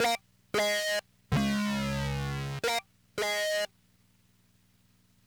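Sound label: synth, Hip Hop and R&B Drumloops; Best Service ProSamples vol.01; AKAI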